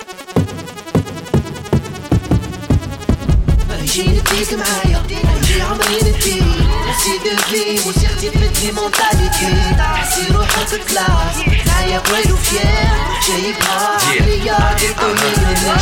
Dz Rap

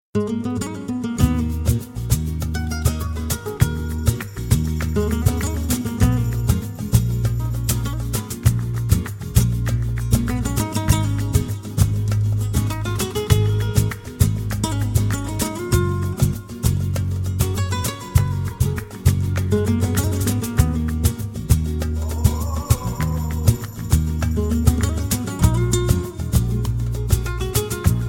Gutiar

